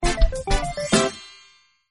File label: Jingle Win Hand Medium